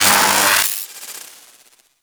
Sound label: Dragon Luck Activate Electricity